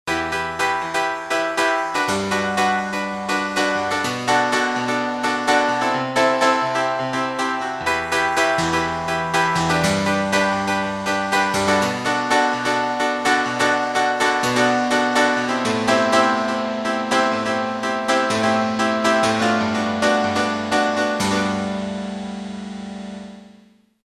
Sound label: Velo Piano; Roland M-DC1